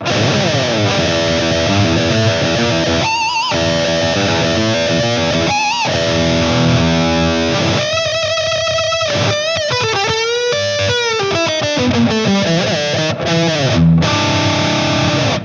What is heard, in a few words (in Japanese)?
wah tone MIX 11時